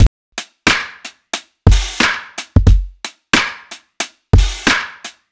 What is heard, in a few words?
Soft Rimshot